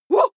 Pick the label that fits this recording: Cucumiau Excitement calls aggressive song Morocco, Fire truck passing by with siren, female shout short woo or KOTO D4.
female shout short woo